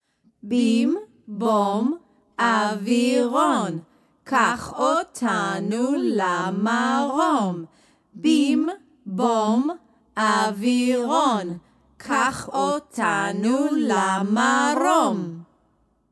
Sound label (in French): Bim Bom Aviron chant